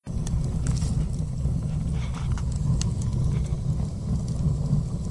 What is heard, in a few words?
burning